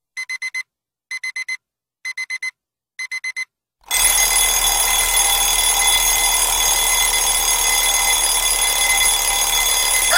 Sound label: funny alarm